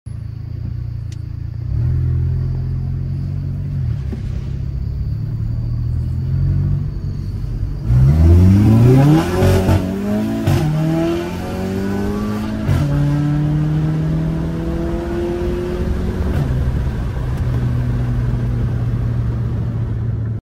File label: kmc, اگزوز ماشین